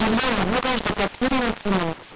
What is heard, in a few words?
noise